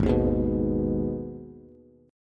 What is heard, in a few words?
G sharp Minor G sharp down Bass, chord; G#m strummed; JGuitar